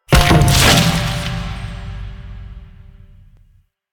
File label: Boon Menu Open; Warframe; Koumei